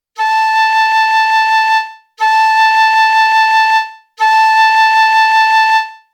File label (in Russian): 1 oktava lya flejta